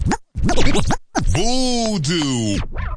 turntable, Scratching and Turntablism

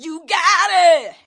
diva you got it, Cheesy Divas, vocals

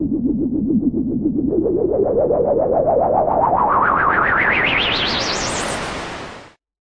noise SH101, Noise Sweeps

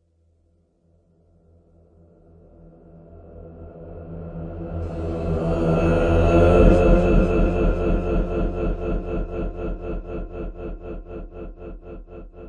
sweep in echo out; Ze Franks Pain Pack; sweeps and fx

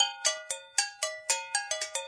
115 bell rhythm; new york; MELODIC LOOPS; WORLD LOOPS

